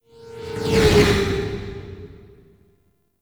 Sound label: Motorcycle Friendly Passbys; MallIntro; Warframe; NineteenNinetyNine; Cinematics